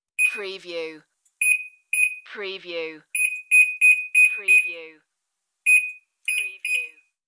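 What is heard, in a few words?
Microwave Beeps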